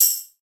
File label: check off; Rin Skin 2.0